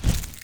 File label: MOVE; C64 Dreams; Multi-Sound Default